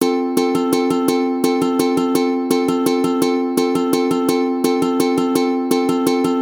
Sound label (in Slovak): Rytmy ukulele valcik